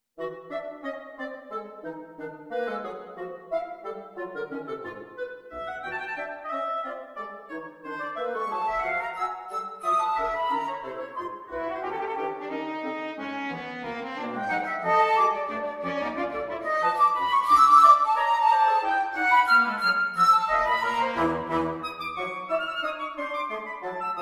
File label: Variation III Dance